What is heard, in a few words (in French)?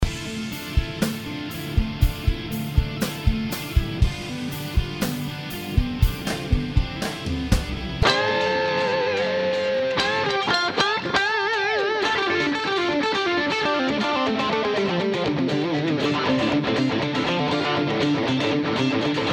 gratte